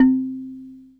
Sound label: DIGIMBA C4; Greytsounds - Scott Peers Sound Engineering